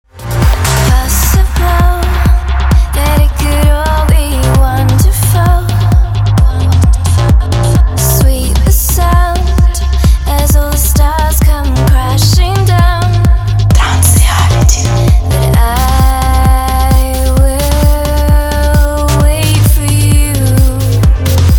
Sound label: ringtone